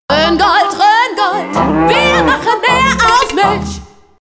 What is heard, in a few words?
Jingle; Rhoengold